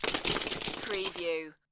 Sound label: Lego, drop several pieces into lego box